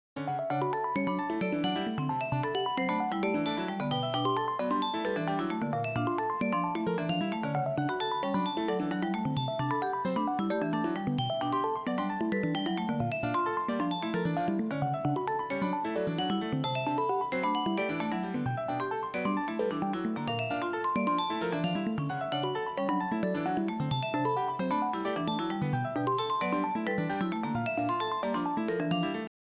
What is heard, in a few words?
harmony example